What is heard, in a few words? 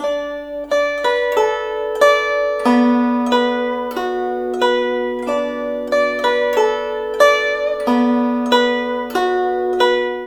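guzheng; Spectrasonics; Heart of Asia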